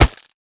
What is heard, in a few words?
bone club strike; Rust